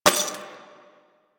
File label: Glass Bullet Impact; Warframe; Ambience; Nineteen Ninety Nine